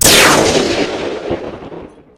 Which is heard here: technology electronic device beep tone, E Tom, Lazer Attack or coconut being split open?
Lazer Attack